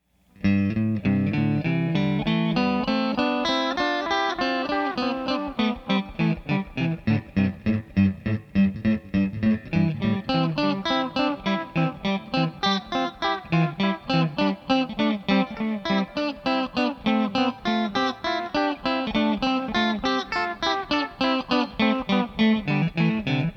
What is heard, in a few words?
echoNote; STcenter&rear